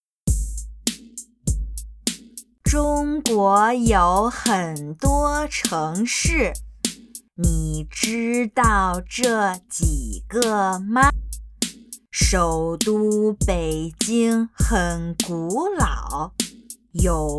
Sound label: Chant